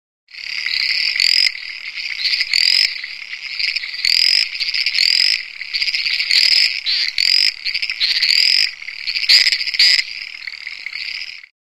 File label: Frogs Tree Croaking